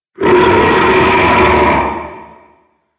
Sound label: Demon Of Distortion, Monster Sound Effects; horror